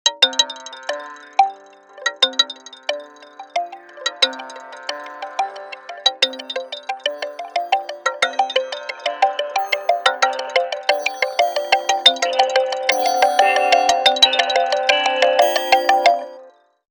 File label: Wooden Percussion, ringtones; Motorola Backflip